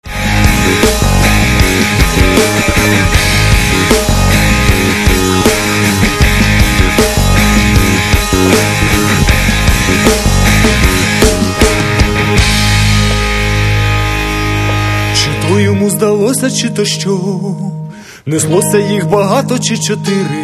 rock

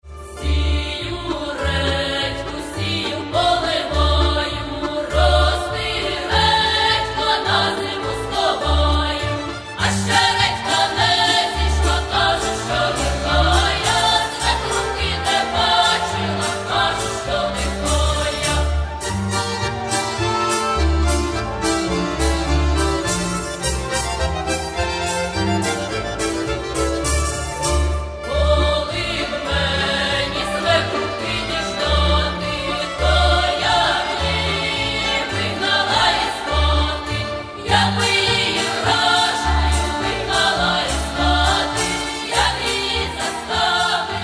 Folk Choir; Cherkasy

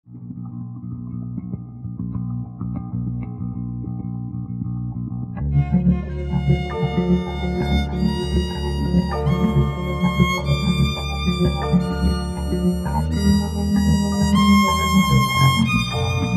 orchestra

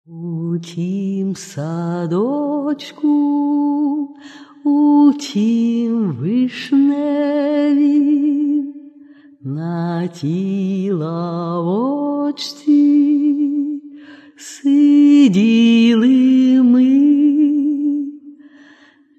romantic songs

